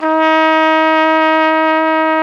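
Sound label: flugelhorn E; BRASS; Best Service; Alpine Volksmusik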